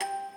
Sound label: musicbox